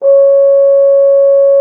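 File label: French horn C#3; Xsample VOL-3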